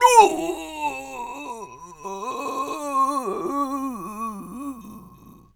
voice male death low; Gamemaster Audio; Human Vocalizations

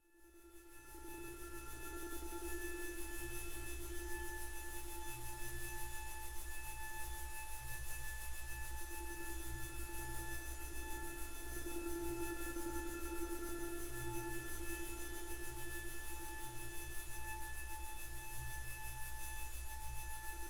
Magnetic Field; 99Sounds; Magnetic Fields

